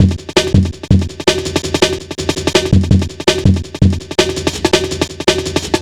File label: Drum Bass, Drumloops, 165bpm; Zero-G; Total Drum Bass